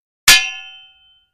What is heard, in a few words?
Impact Vari Metal, crashes